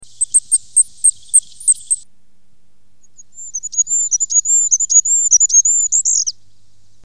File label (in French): roitelet, Musique